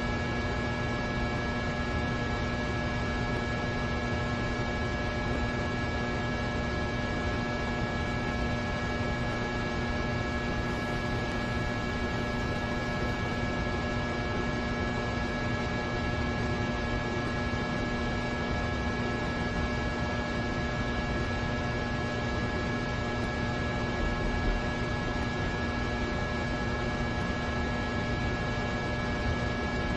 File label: Lobby Ambience; backrooms 2d android ios online windows gamerip